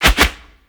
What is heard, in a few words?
Melee Swipe; Enemies; Warframe; Necramech